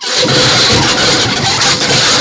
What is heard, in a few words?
SCRAPE; wipeout